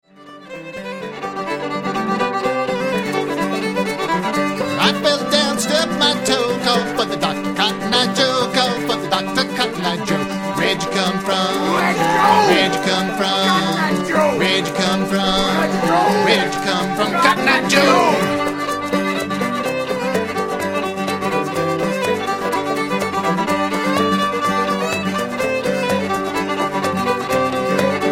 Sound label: bluegrass